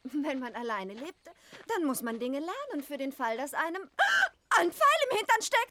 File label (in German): Pfeil; Shrek